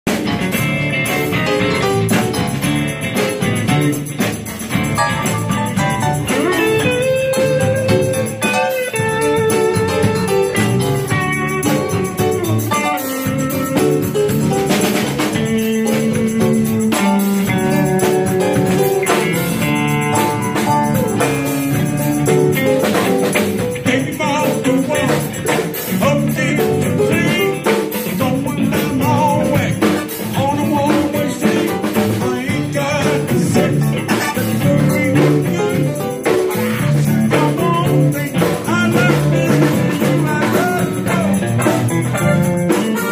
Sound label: cover band